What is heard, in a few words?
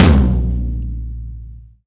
TOM, Laidback; Sonic Foundry (Sony Creative Software); Drum Tools